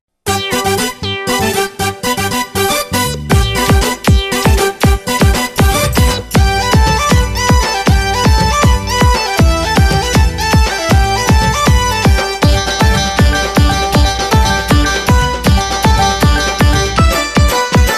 folk ringtone

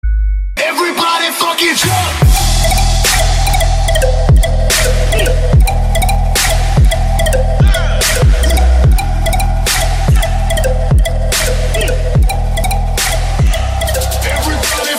iphone x new ringtone

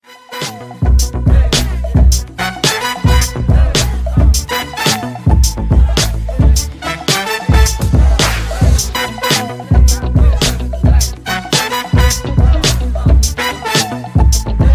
pumping party ringtone

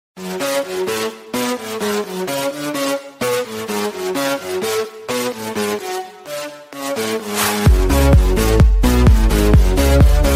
remix ringtone; ultra music festival

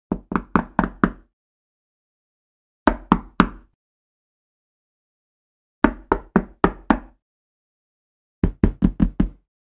knocking ringtone

